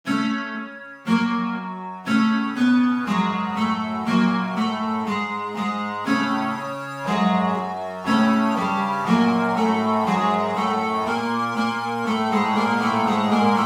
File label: gloomy loop